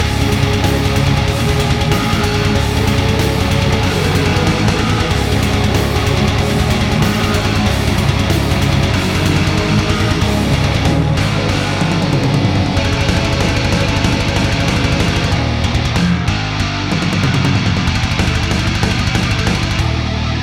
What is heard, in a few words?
BGM ramen